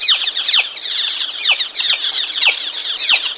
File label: chick; valve; ambient; animals